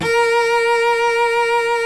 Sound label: SOLO CELLO